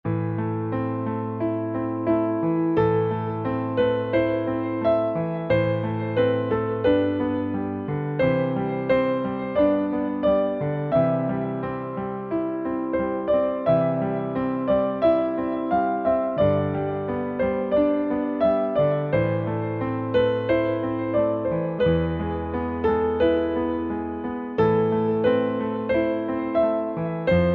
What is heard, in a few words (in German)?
Maria durch ein Dornwald ging Klavier